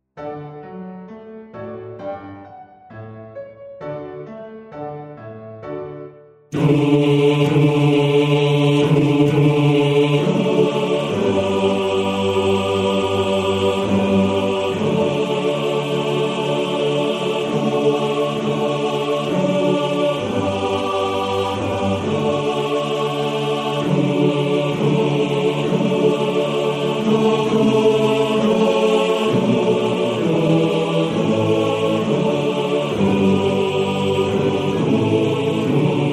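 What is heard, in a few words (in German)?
Chor, Männerchor; Christenglaube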